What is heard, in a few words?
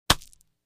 Large Flash Bulb Low, flash pops